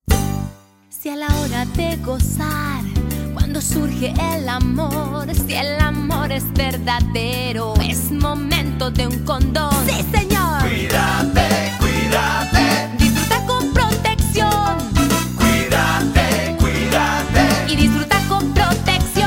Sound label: Salsa